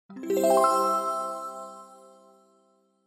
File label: Scene Change 1Up